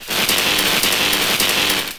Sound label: paralyse